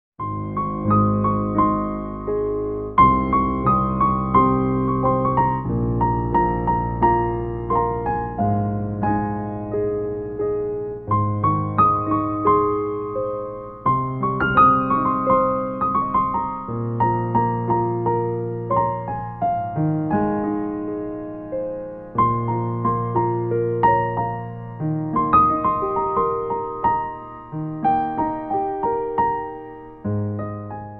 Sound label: Piano